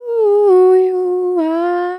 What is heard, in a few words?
Oooh, Vocals, Dry